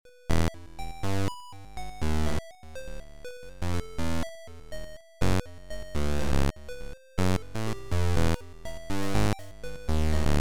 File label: Squeak